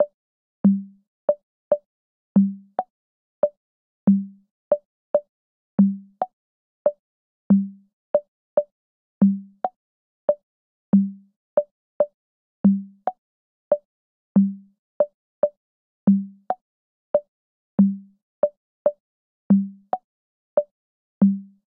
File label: Metronome; underhero linux macos windows gamerip 2018; The Moth Queen - Metronome B